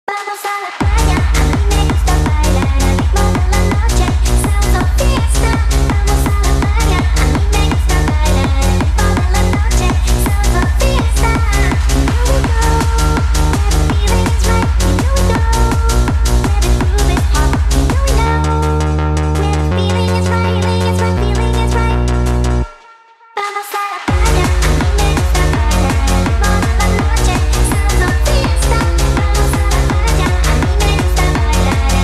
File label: TECHNO SPED UP